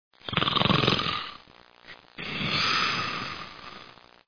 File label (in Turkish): horlama, insan